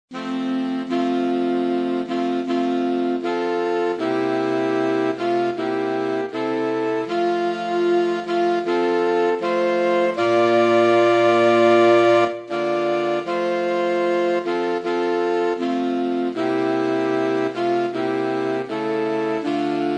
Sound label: hotarunohikari sax